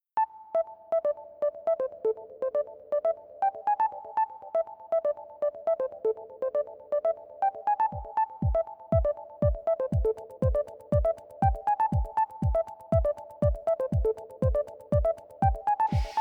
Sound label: Instrumental